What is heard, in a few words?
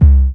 Kicks; Heartbeats